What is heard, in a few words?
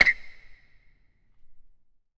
Basketball Court